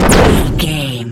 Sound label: energy blast large